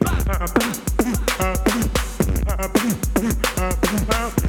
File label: D3 BREAK